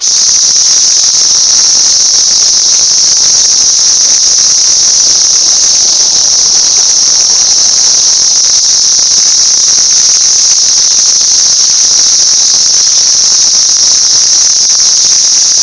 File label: cicadas